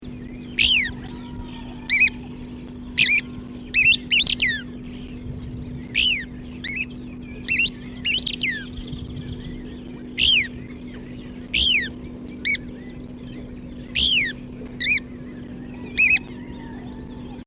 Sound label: couchs kingbird calls